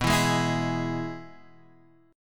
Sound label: E Major